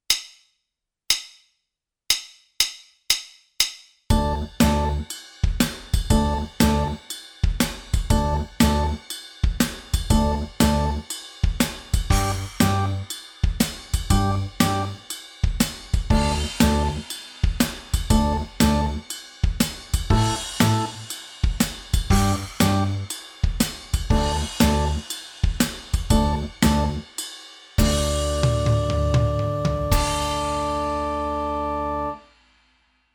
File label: Triplet Blues Track